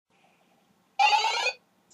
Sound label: mushroom powerup; happymeal uk